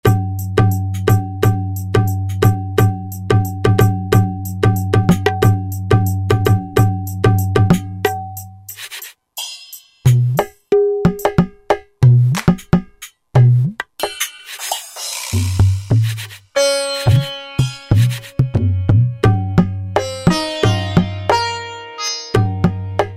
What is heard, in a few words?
India Set, Roland SR-JV80-14 World Collection Asia